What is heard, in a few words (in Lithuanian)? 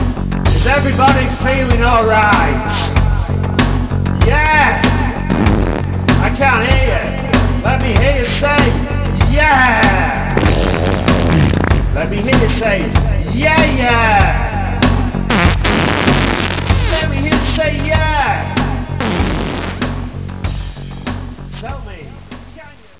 koncert